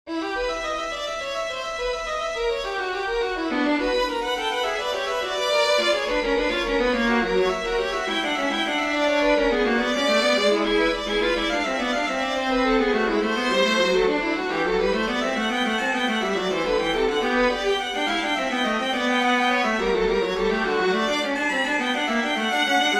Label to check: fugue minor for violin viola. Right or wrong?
Right